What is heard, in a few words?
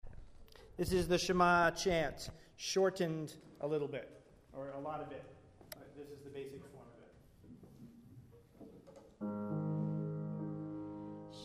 shma chant